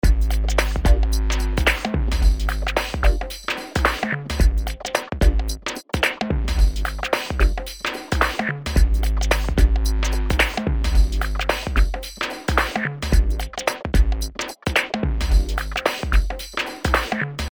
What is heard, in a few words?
Punch